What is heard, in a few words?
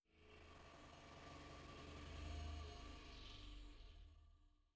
ambience; Netease; gank